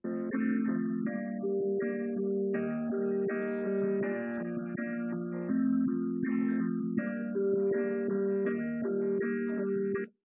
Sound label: wdrums; SiSEC10; underdetermined